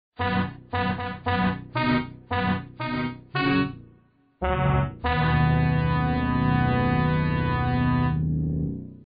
race fanfare; vapesquared

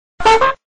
toot

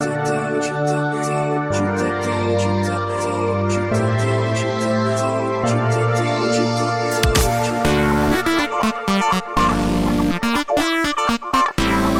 funky piano

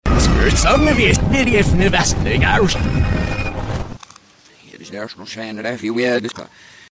dnb, reverse

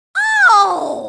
OOOHHH

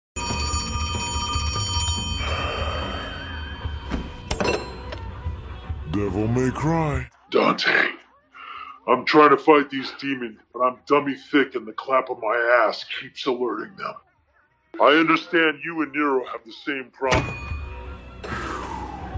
devils cry

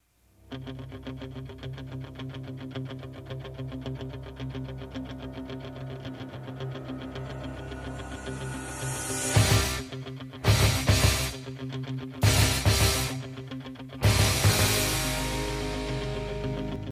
loss; cave

